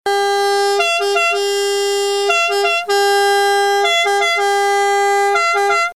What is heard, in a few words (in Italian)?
Sirena bitonale pneumatica (italia)